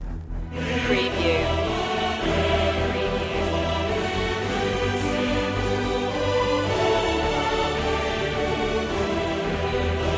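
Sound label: no percussion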